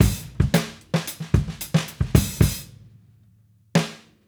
Raw Funk Drums Fill; Sonic Foundry (Sony Creative Software); What it Is! 70s Analog Funk

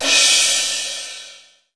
crash cymbal

